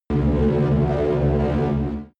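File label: extrinsic hum, energy; energie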